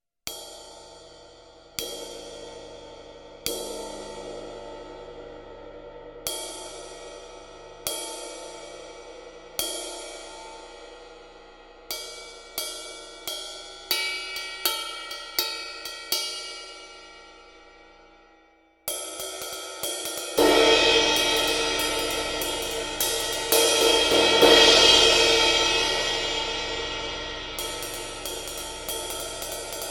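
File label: ride SABIAN HH MED 20, CYMBALES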